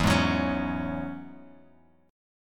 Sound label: E 6th Add 9th E down Bass Standard